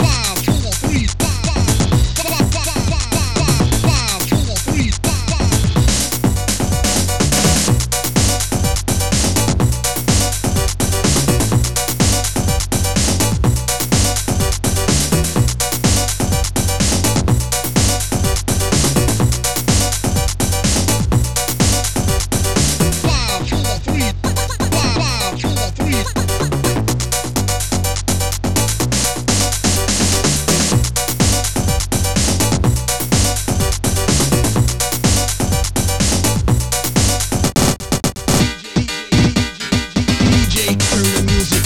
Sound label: amiga housemix